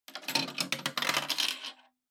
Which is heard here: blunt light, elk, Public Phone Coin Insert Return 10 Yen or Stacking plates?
Public Phone Coin Insert Return 10 Yen